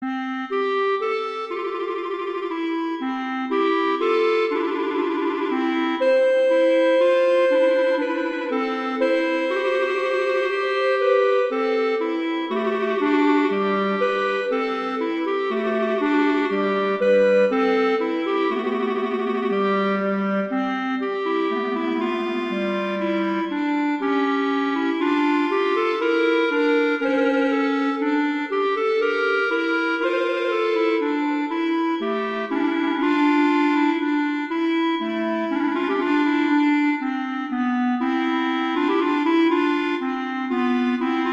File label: Larghetto; Telemann; Canonic; Sonata 2